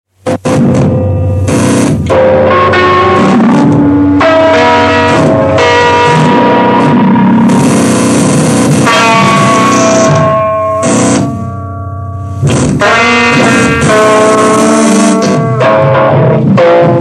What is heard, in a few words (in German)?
trompete